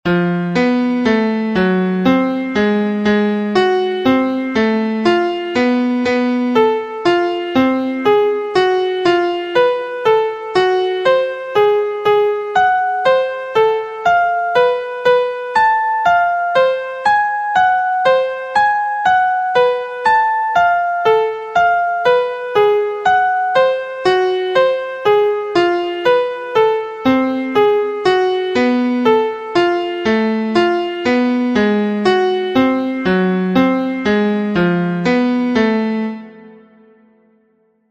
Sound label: Degree 1 b2 b3 3 b5 5 Atomic Scale Key F; harmonic and melodic equivalence; trichord pair